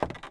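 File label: creak walk left, footsteps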